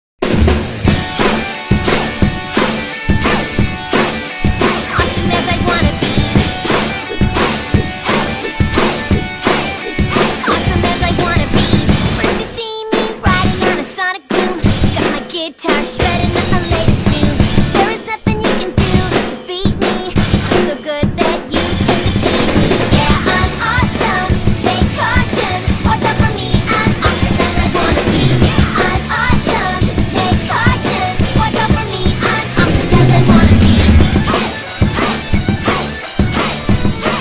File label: Drum Cover